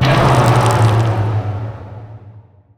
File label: ink swell stun; weapons; dota; grimstroke